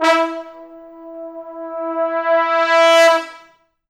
E3, Pop Brass

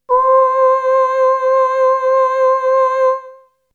Melody oneshot; ZERO G - Datafile DISC 2

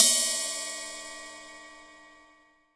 O Z RIDE

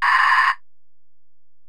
EPerc, Alesis DM-PRO; 300 Drum Machines

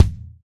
TY Kick; Roland BK-5

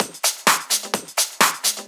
Discohouse Loop 128 BPM

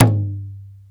bata

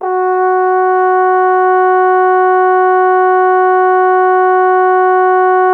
Trombone F#0; Miroslav Vitous - Solo Instruments VOL-1

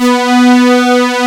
OB STRINGS; E-MU Formula 4000 Series Vol. 3; Analog Odyssey

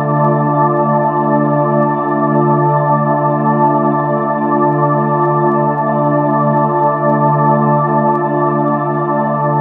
pad; Sonic Foundry (Sony Creative Software); Ugly Remnants VOL-2

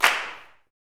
CLAPS, Gospel; CLAPS+SNAP

